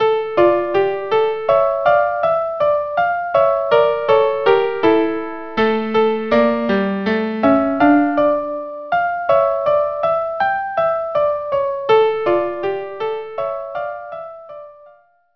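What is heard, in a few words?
violin violin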